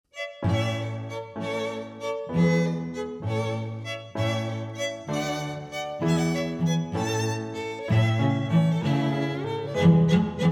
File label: Menuet